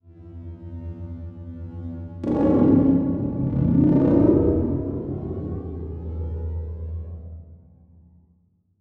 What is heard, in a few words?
Title Transition Burst; Adobe; Production Element